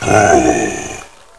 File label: groan; jaba